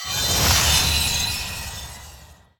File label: Naaru Shard Play